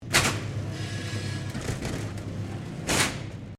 Door Rollerdoor Small Supermarket Malfunction Stereo, Small internal roller door; Miscellaneous Doors